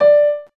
PIANO

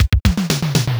Fills 120BPM

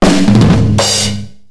Drum Solo; max payne 2001; (Sounds~ragnarok) Drum Solo

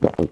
Beer; Gong; Neighbours From Hell Console Edition; GC, Xbox

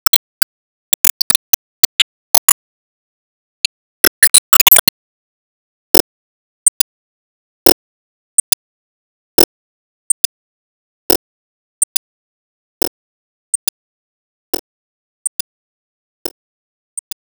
Enemy Rose Queen Active Attack Loop

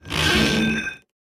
Pipe Open Squeak; Going Under

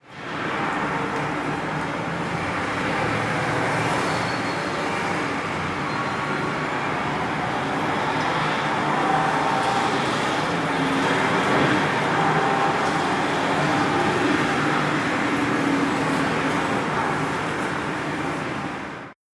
Cities paris Traffic

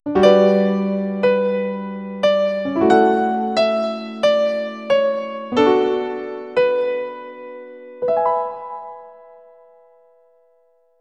PIANO; D.Gray-man Sousha no Shikaku; PS2

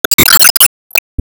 Enemy Underground Active Appear

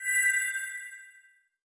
Reward Coins Sparkles; subway surfers city sound effects; Android, iOS

